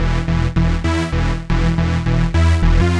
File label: Wavee 160 E, future rave samples, 160bpm